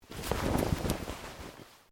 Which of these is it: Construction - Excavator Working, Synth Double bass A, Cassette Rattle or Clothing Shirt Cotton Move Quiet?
Clothing Shirt Cotton Move Quiet